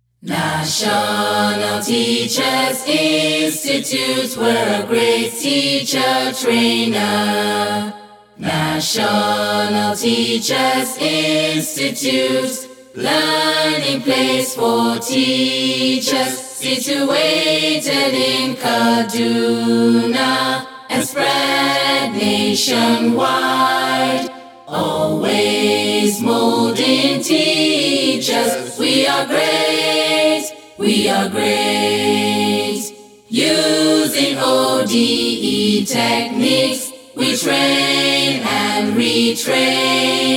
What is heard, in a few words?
Anthem Accapela